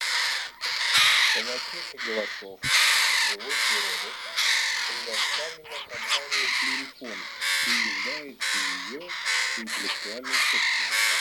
Серая ворона Cornacchia; plurifon

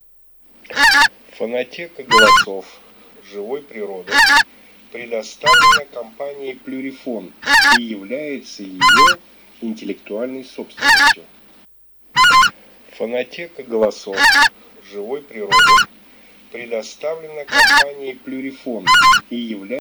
Белолобый Oca Lombardella; plurifon

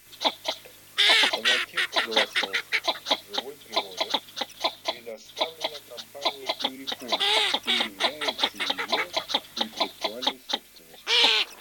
Широконоска Mestolone; plurifon

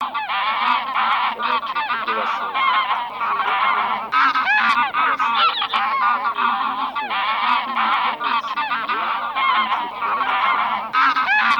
Гуменник базар Oche Granaiolle; plurifon

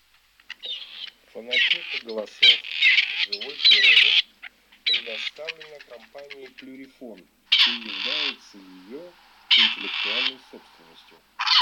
Куропатка серая Starna; plurifon

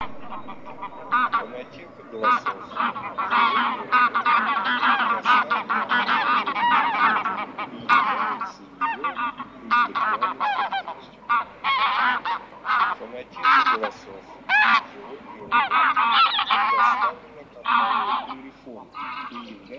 Гуменник базар Oche Granaiolle

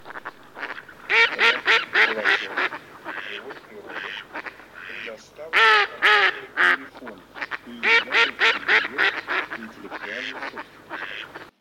Кряква Germano; plurifon

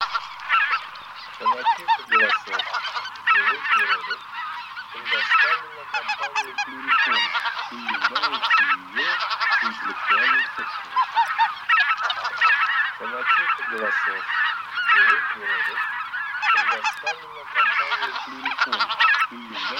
Белолобый Oca Lombardella; plurifon

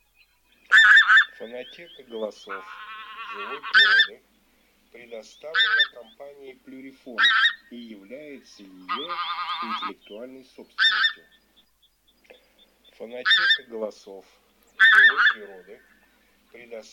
Гуменник Oca Granaiola

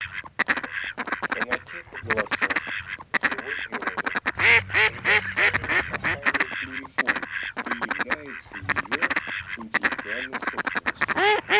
Кряква Germano; chip mini 234 plurifon

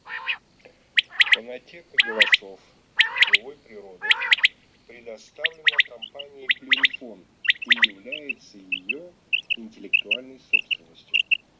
Перепел Quaglia

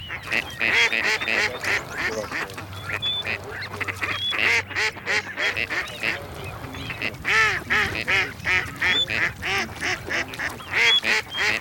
Серая утка Canapiglia Кряква Germano; plurifon

